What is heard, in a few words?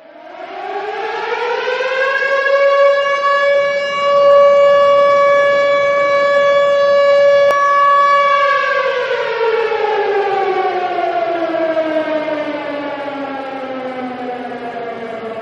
emergency unlock